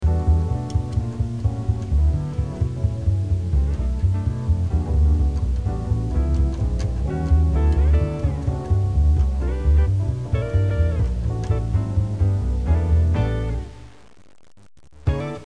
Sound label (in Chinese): 片头音乐